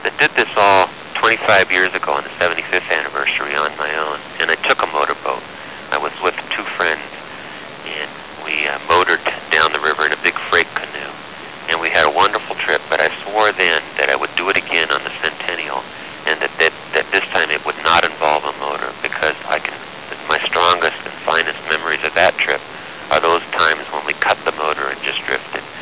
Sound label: no motor